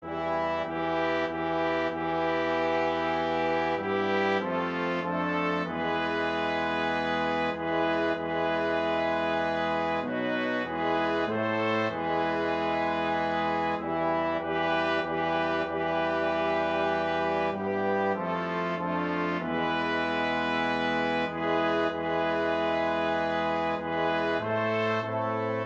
Third Mode Melody for Brass

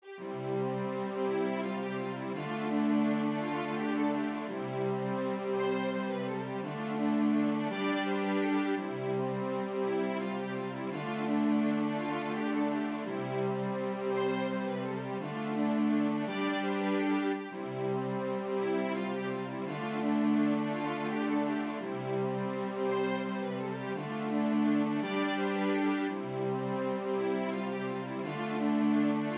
Drone Unit; Dargason

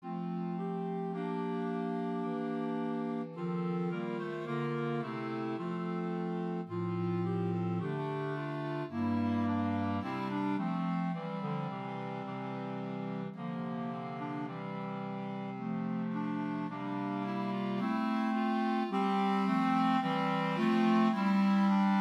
Sarabande Clt; Grieg

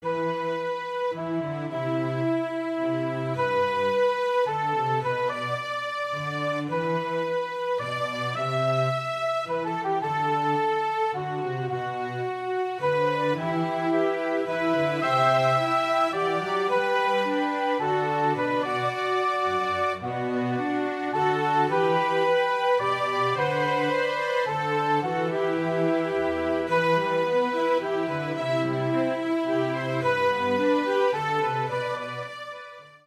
Modal Melody

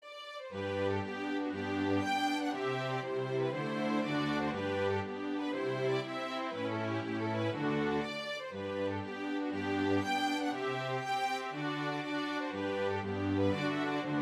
Hornpipes

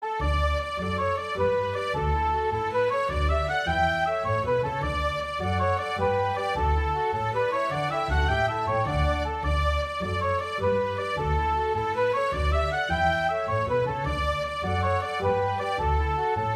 Paddy Carey s Jig, 3 Jig tunes; comprises one traditional tune and two that I composed back in 1981; folk band

